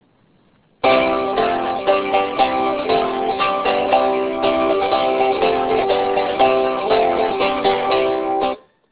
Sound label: cittern; baltimore